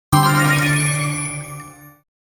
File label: Game bonus reward